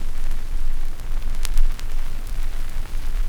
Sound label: X CRCK L, RECORD-FX; XP RECORD-FX; Best Service; XXL Production Effects